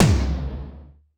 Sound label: Dance Club, Drum Machines, Korg 01W